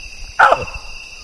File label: baboons; vocalisations